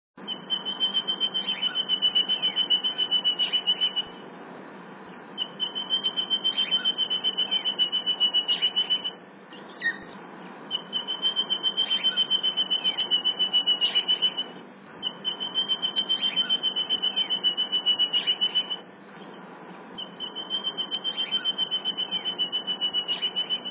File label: spinebill